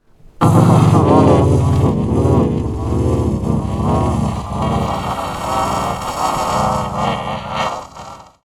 Moan

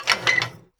rotate

